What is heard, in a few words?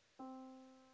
yamaha Disk